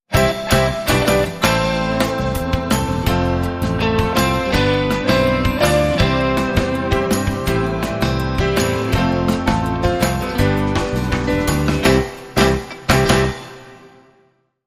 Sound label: Rock N Roll; 15sec